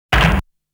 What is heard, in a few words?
Hit Sound; Saint Dragon